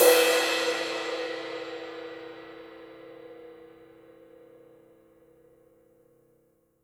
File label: CYM, Real Drum Kit, SWING KIT; Best Service ProSamples vol.43 - Real Drum Kits